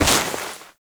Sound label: Step Snow, Footsteps; Enemies; Foley; Warframe; Duviri; Dax